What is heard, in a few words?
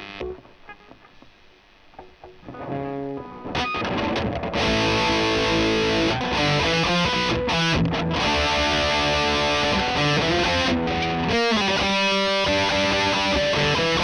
E Gtr